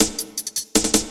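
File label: loop snare drum, 160 BPM, Drum & Bass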